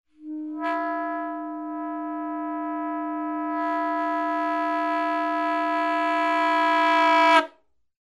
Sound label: ADSR